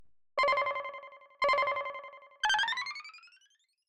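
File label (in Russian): тревога на дисплее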